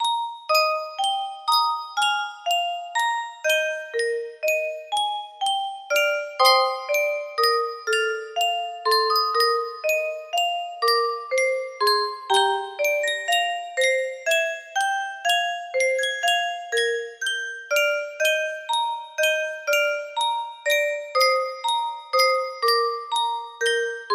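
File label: music box theme, music box melody; chrono trigger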